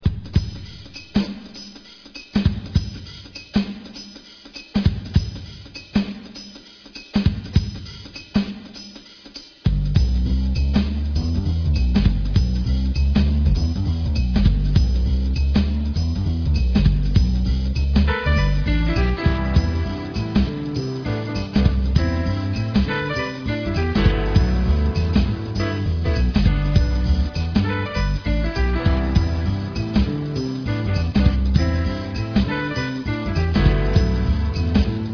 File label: jazz fade